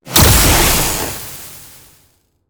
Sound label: store spawn; Original TK Sounds